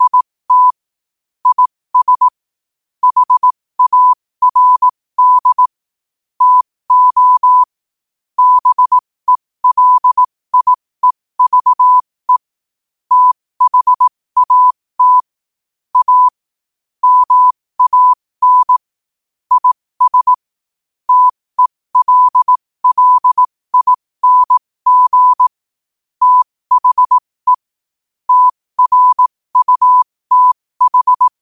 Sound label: 5 wpm, morse